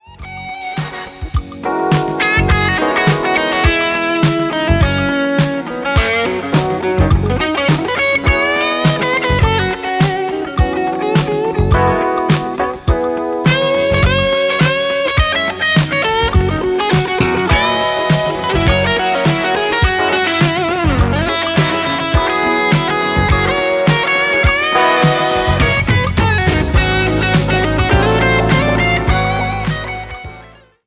Fusion Guitar

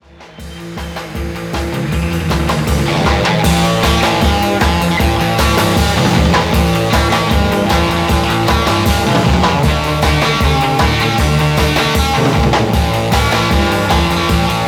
guitar inst stylus

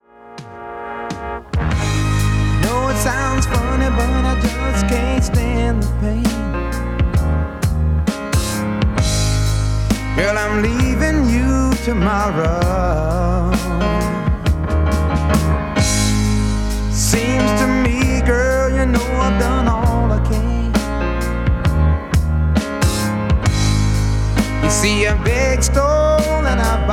soul

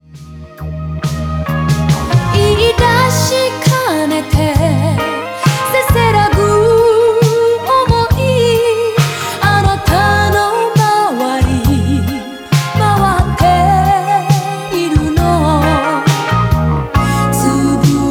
female vocal; 邦楽・女性ボーカル; 女性ボーカル01

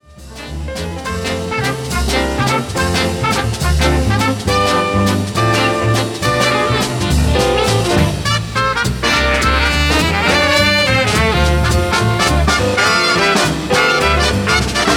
jazz bg

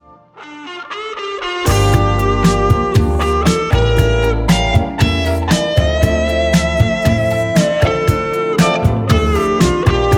fusion guitar laser

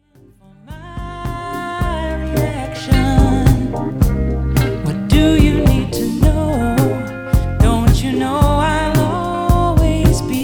70 female pops; ポップス（レーザーターンテーブル使用）; 70年代女性ポップス(24bit・96MHz)

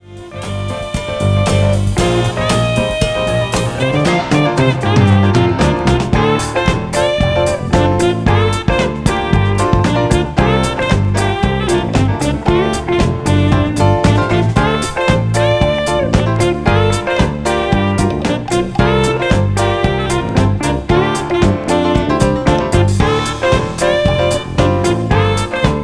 fusion

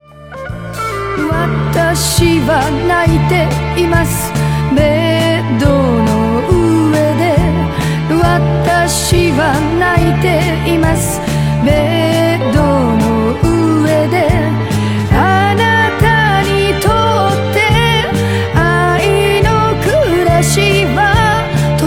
radio jpop

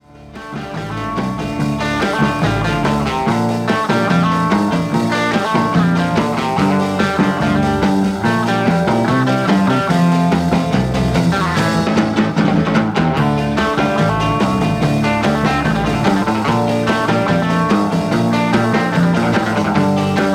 rock inst

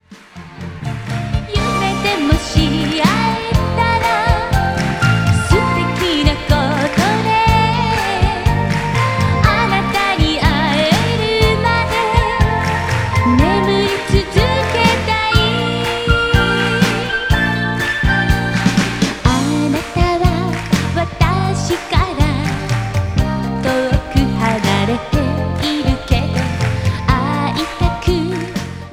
idol

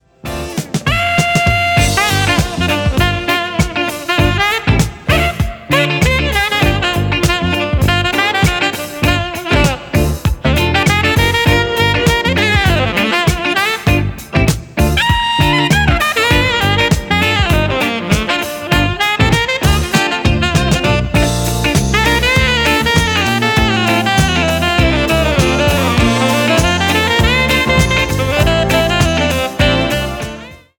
Fusion sax

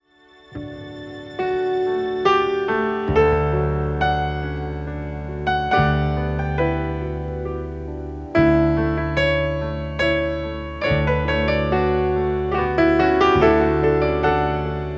Fusion Piano

